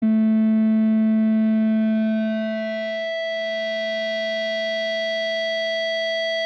speaker feedback sound effect

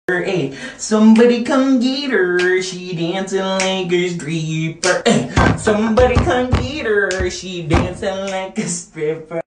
money sound

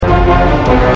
duna dun dun

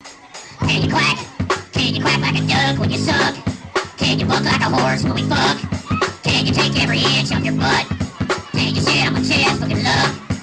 goal song fast

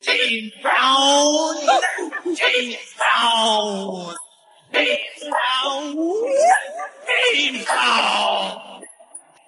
vocals mono

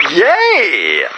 yay

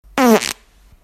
fart two

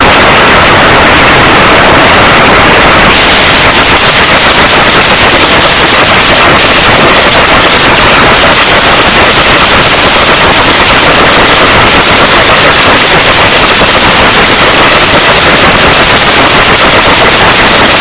toyota supra earrape

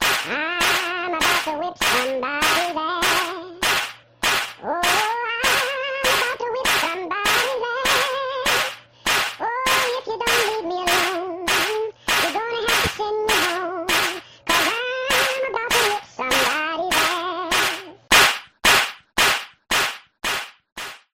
slaps

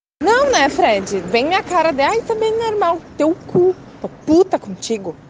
romantic

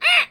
sub alert

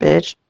beach but jazzy

